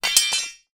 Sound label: impact metal rod drop solid ground